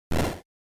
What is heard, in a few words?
Noise; GB General